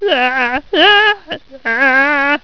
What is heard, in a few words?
argh